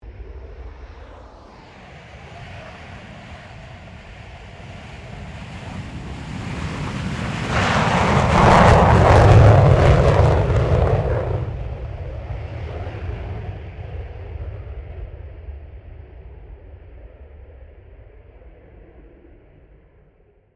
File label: AIRCRAFT FA-18 HORNET TAKEOFF STEREO; RAAF FA-18 Hornet; Airplane; Vehicles; Airplanes